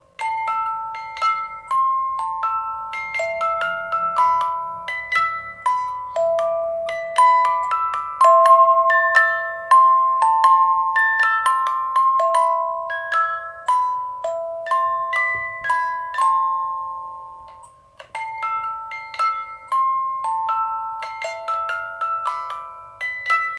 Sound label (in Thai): กล่องดนตรี